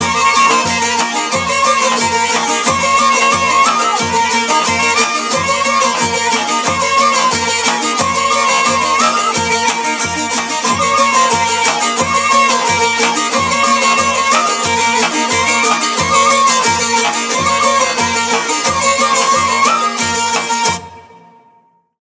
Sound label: steady 90bpm melody coda; Narodno